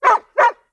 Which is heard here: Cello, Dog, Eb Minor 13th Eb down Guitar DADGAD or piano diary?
Dog